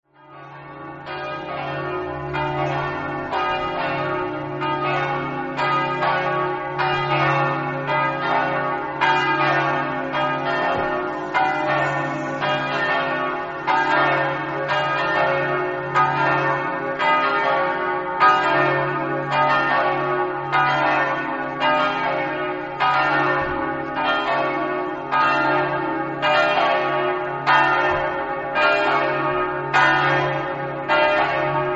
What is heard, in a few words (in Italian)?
campane; slorenzo